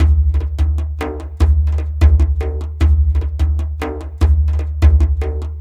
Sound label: Dumbek Fast, Ethnic Percussion; Sonic Foundry (Sony Creative Software); Ambient Atmospheres & Rhythms